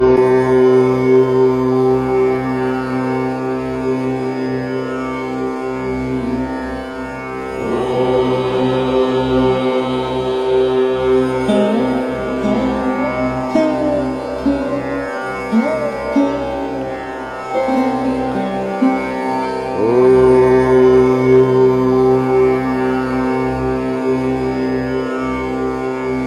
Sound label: Om Chanting